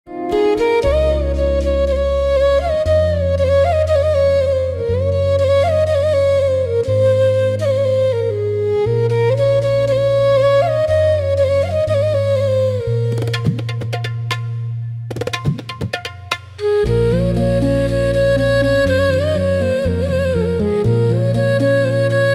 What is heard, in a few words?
Soulful Instrumental Bit Flute x Tabla